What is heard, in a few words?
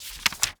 book turn